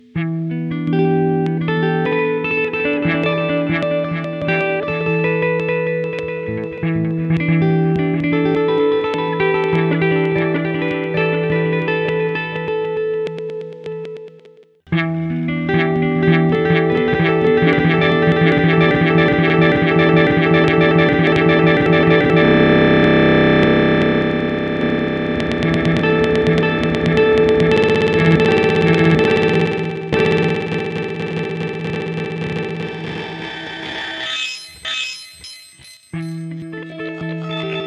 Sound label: SH pitch olay; molecular patches